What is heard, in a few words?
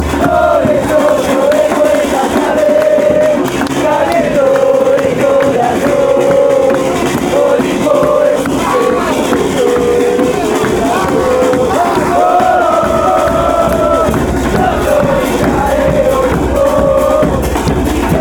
olimpo chant